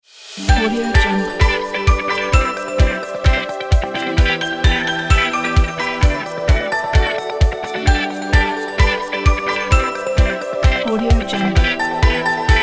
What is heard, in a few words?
uplifting corporation